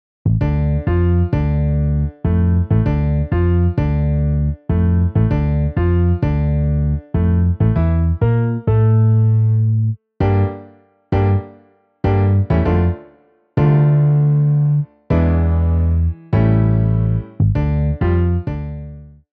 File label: Piano Bass MIDI; Salsa